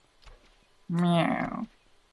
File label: meow